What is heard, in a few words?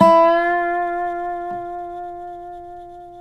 SLIDE, Dobro Slides